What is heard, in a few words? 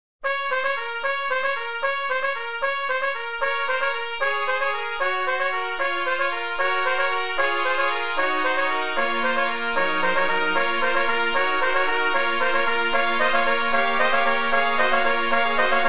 bells; holiday; tpt 4tet; Carol of the Bells